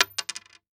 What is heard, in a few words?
Household Coin Nickel Dropping On Wood Table